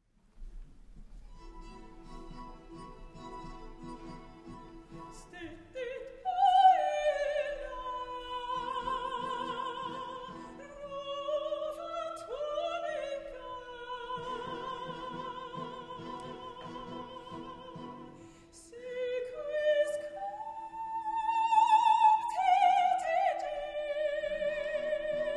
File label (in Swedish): Sopran solo